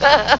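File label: giggle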